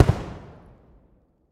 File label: Firework Explode